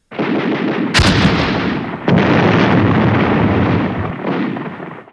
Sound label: soft